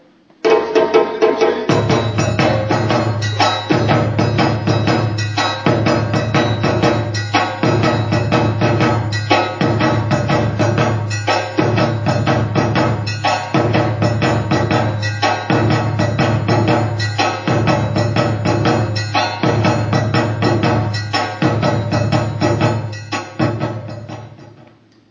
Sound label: Dunumba